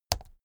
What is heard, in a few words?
keyboard laptop key press slow hard